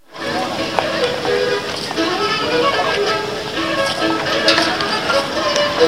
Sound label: violon arabe; un instrusment bizarre joué en face dune cathédrale à toulouse